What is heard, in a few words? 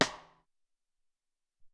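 Ambient Stick 1, Alesis SR-18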